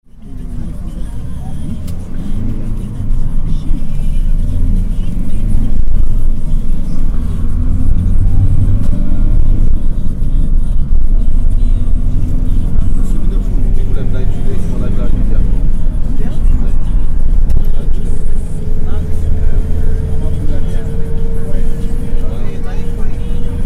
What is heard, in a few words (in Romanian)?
microbuz; calatoria la mare